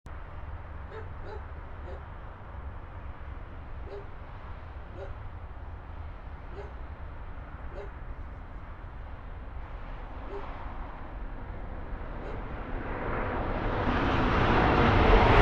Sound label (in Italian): Treno; Eigenmike; ComunePR; NuovaStradaF